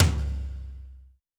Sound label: big tome drum sample c sharp key; Duration 0:02; Bitdepth 24